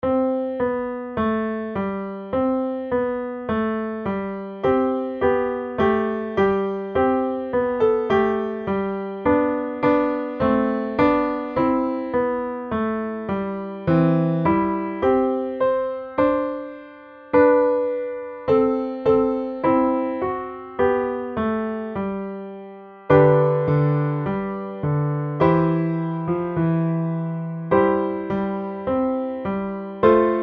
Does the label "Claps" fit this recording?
No